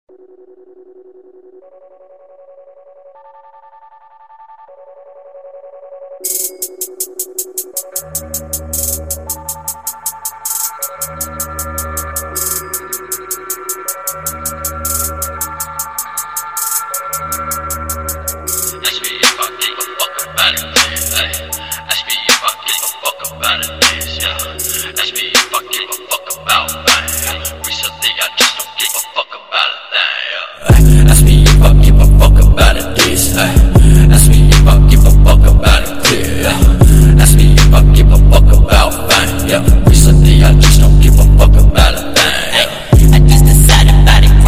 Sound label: ghost